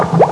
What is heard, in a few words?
BUBBLE